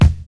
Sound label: KICKING; Univers Sons - Kitch 70s